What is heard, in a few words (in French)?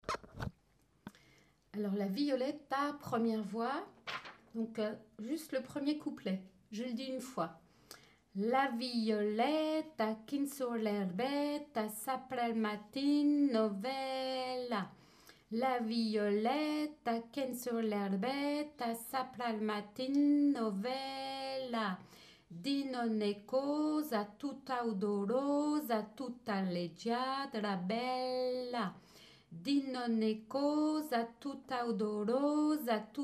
1ière voix 1ière strophe texte plus chant